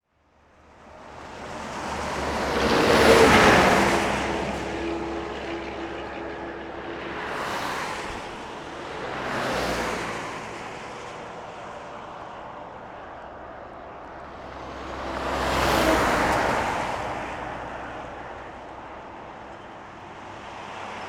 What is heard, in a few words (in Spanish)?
paraje; salta; palomitas; rn 34 km 1554